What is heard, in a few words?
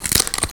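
metal break; Total Control - Action Cutz; REACTION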